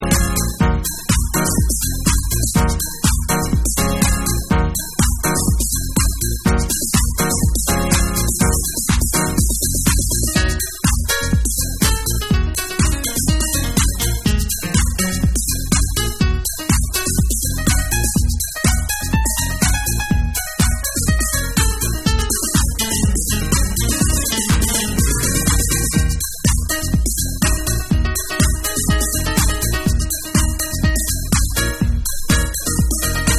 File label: Instrumental